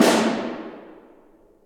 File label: Snare